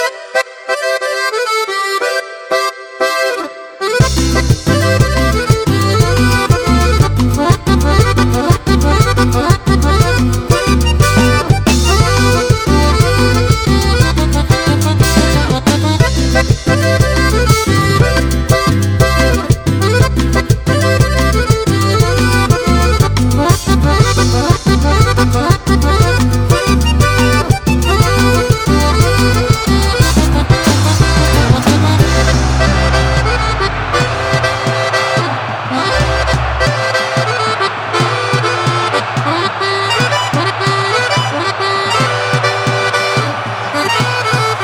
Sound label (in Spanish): melodia